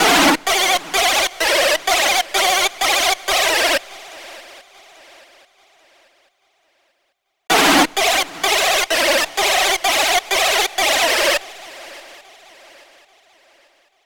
synth lead; Session 09 - Retro Fit

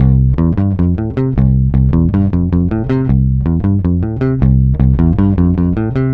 Bass C; Lounge; Zero-G Kocktail Kollection; Construction Kitz; Flutes & Swingers 156; 90 s Sample CDs

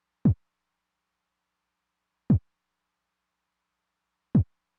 kick drum w accent, Roland TR-909; Roland TR-909 Ableton Project

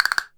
CASTANET, CASTANETS

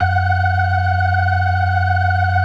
BLOOD OCT, Synth and Organ; InVision Interactive; Keith Emerson; The Most Dangerous Synth and Organ; P.BLOOD OCTV

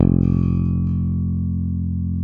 SPEC SUSTAIN, Bass

